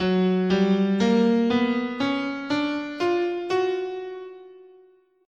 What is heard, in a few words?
Gb Double Harmonic Major, scale